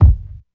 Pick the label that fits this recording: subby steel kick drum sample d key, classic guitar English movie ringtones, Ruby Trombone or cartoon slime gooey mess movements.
subby steel kick drum sample d key